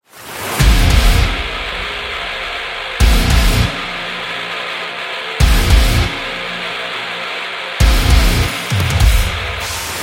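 Metals, Powerful metal background music built on heavy guitars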